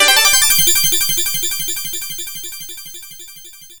time stone collect; sonic cd

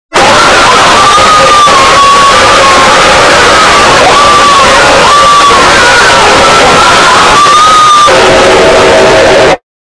jumpscare sound; five nights at tonils

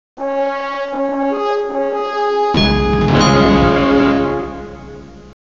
tada game won

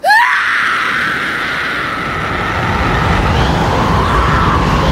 scream; shinji

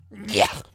disgust sound; doobus goobus

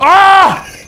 pain; nogla

